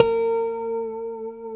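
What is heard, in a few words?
B4 TAP harmonic, guitar; Spectrasonics; Hans Zimmer Guitars VOL-1; JC-TAPONICS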